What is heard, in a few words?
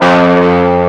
EG OD F1, GUITAR; AKAI S-Series CD-ROM Sound Library VOL-8